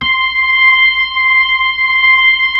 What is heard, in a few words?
B-3 Chorus